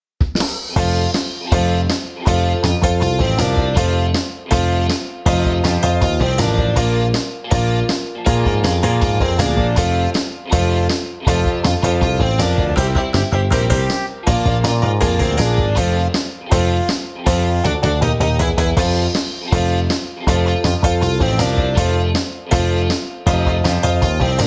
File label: air guitar rock